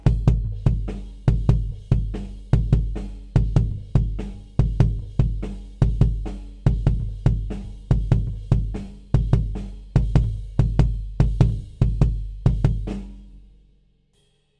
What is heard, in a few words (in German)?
kick proberaum